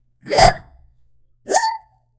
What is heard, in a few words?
cute monster gets hit and; game sounds